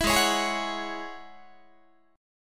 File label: Ab 7th Suspended 4th Sharp 5th Ab down Guitar; 19,16,16,18,17,17; strummed